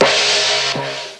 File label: clue gong; nippersounds